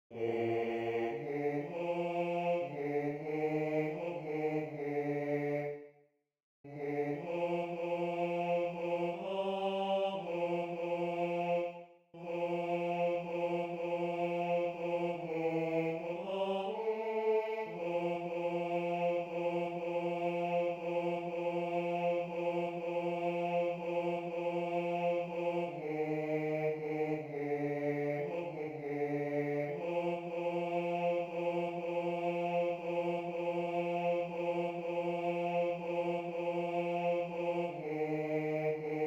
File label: Bass I; Apparuit